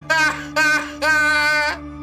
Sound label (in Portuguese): risada